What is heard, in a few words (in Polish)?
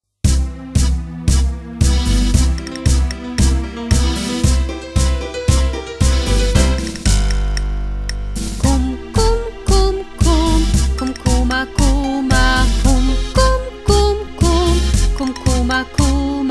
Żabie tango